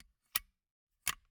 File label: Gumball machine, candy door, plastic, open, shut, wide; Eiravaein Works; Vaeyan IV